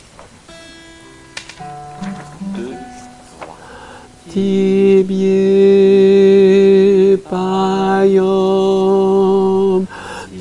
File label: TENOR, chorale; Tibie Paiom